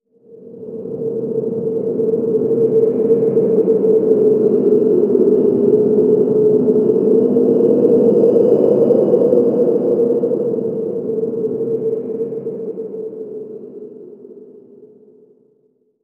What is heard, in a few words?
environment wind stinger